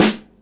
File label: SD, Drums Acoustic; USB Soundscan vol.10; AKAI; 90s sample CD; ALL SD